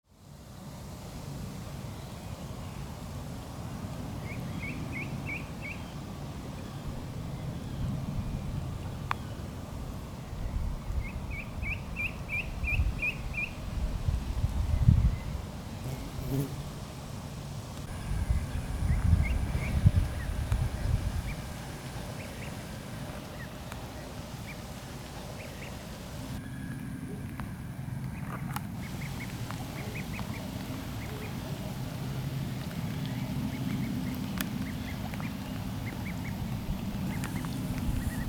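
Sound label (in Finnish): sinitiainen; sinisorsa; emo ja poikaset; linnut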